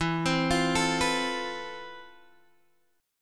F Major Sharp 11th, E

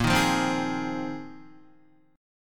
A sharp 7th Suspended 2nd Suspended 4th, Guitar; A#7sus2sus4; strummed

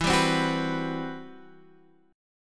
C Major Sharp 11th E

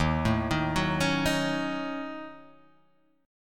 Eb Minor 7th Flat 5th, Guitar Half Step Down

